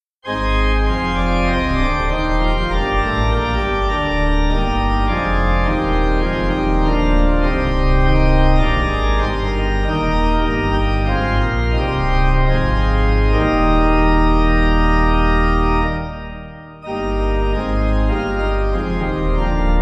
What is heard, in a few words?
Christ Be My Leader, Slane, Pipe